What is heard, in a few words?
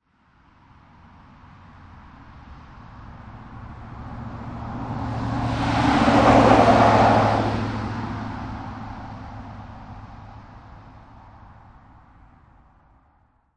Truck By And Away Dry Road 35mph, Transportation Automobiles, Truck, Pickup Truck, By And Away, Dry Road, 35mph; Car